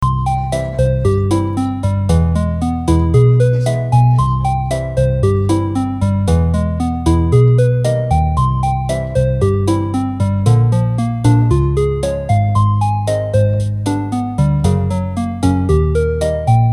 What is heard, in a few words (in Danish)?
stueorgel 114.87